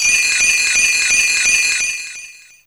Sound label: Bell Tree G4, Fairlight CMI IIe Bells